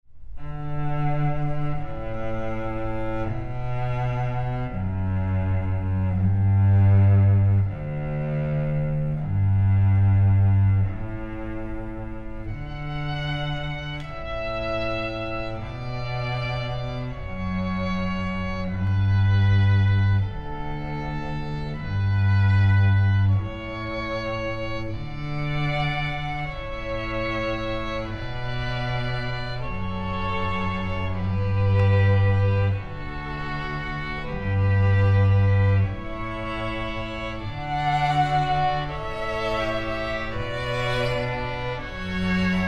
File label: Canon, strings